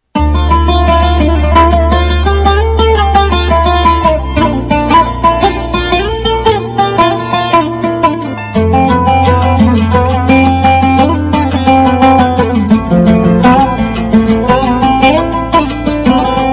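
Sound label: good and soft music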